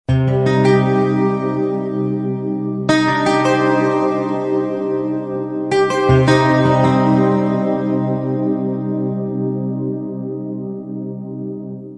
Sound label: mexican guitar ringtone